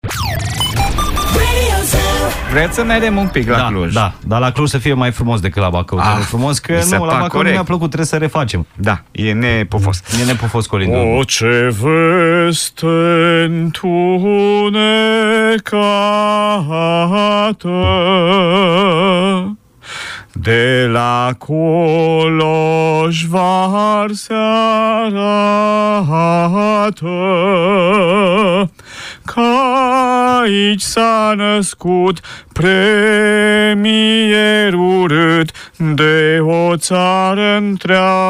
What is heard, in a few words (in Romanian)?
Colind judetul Cluj; colindele pe județe